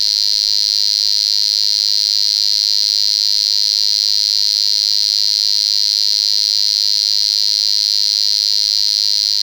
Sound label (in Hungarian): markans, helyzetjelzo kezi; Angyalföld